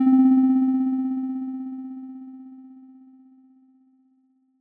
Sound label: feedback container; reaper